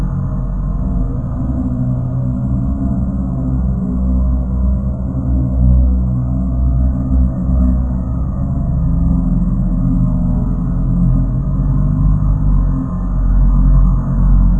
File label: Scary Drone; Doom 3